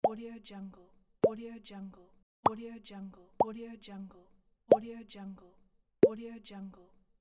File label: plop; eliansproductions